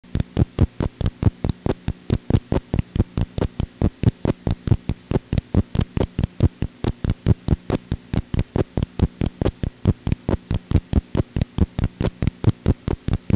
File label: weird loop